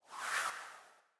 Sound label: Sci-fi Swoosh